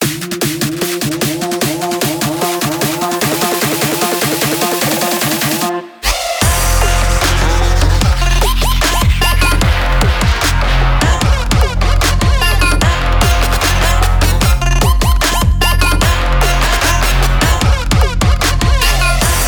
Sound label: trap